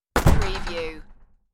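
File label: Break Down Door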